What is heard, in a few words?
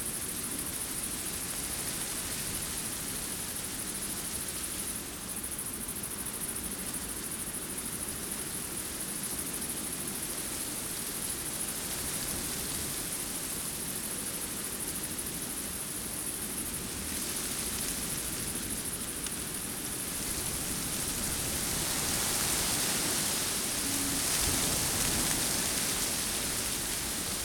wind leaves; firefight